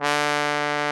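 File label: brass, Solo T.Bone; Roland L-CD702; Solo Tb 550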